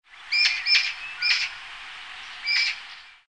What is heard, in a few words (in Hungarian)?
szarka